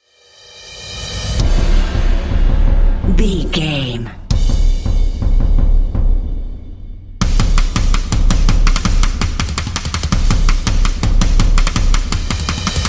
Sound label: dead or alive dramatic percussion